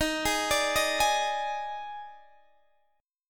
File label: D sharp Major 7th Suspended 4th, D sharp, down, Guitar, Open D